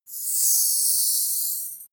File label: marker underline